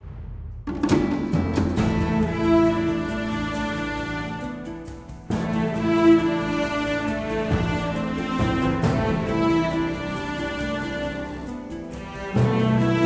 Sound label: Music Battle